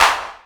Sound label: clap, House Oneshots; E Lab; XTc Files of House